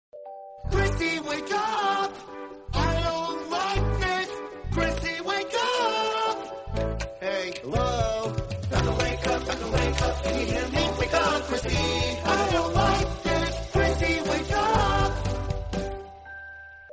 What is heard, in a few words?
wake up alarm tone; chrissy